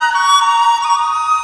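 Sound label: CH FLUTE, Chinese flute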